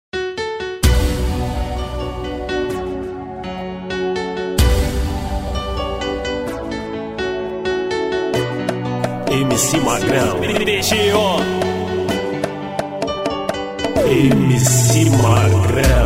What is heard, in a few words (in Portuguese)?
funk mix